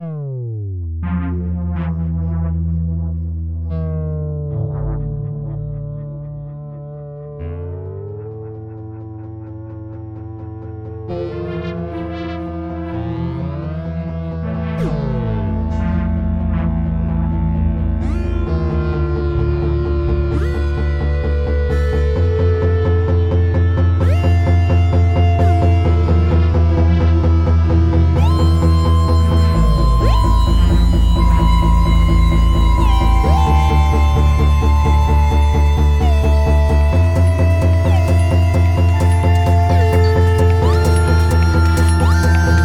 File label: CMI; arturia